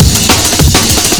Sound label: break more percussion w snare